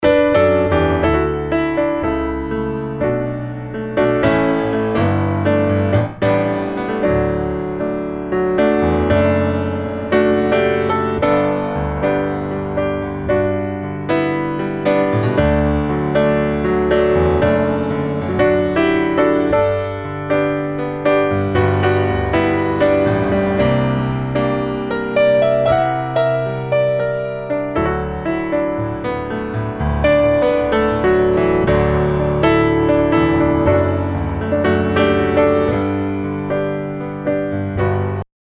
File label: Pno stereo bar